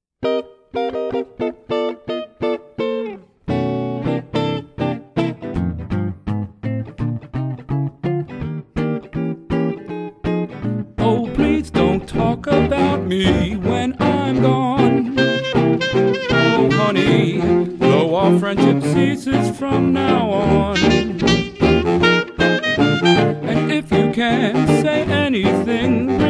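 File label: Guitar and Male Vocal with Clarinet Dixieland Style; Swing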